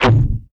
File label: low drop bass kick